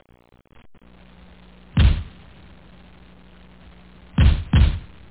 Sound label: Gunshot